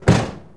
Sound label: Humvee Hood Close; Trucks